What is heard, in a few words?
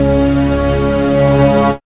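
ORCHESTRA CHORD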